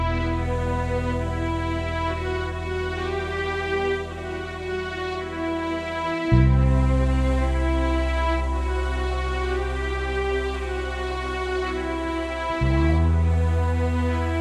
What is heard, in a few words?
Guitar